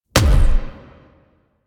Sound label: Punch; Warframe; Quests; The New War; Weapons; Omicrus